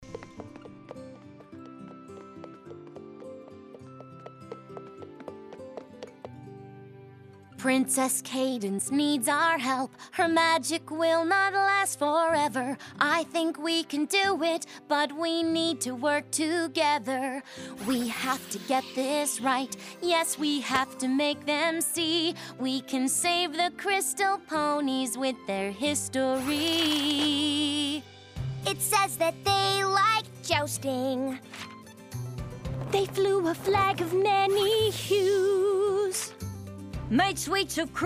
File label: Acapella